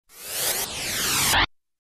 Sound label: Double Noise sweep